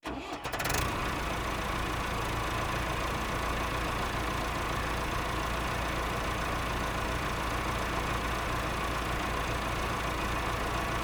Massey On Board Static Idle Revving Shutdown Engine, Massey-Ferguson 3060E